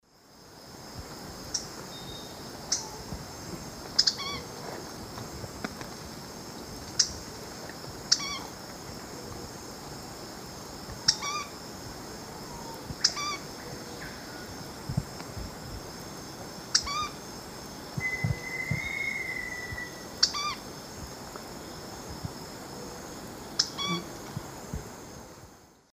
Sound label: Synallaxis rutilans, song; S. America; Eastern Lowlands